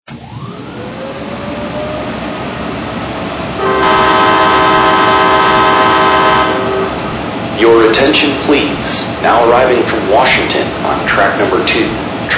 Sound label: AEM-7, trains